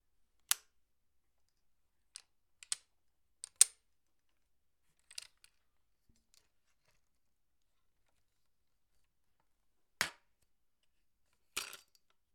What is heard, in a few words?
Tripod Setup